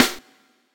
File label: SD, Dance Drums